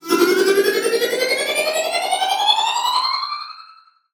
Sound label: Sweep; 7rystan's 600+ FREE EDM SAMPLES & DRUMS; SFX